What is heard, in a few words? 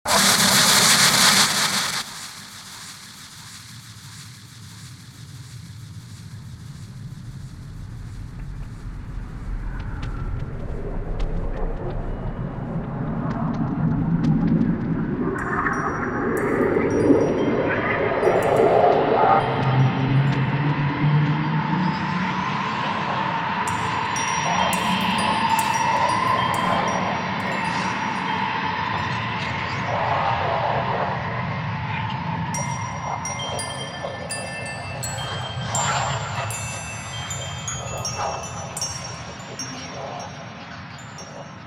chime chime chime